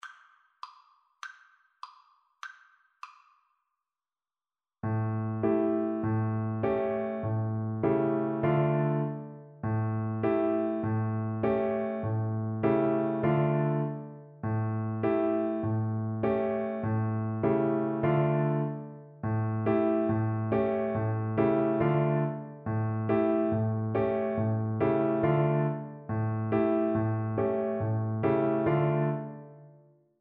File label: trumpet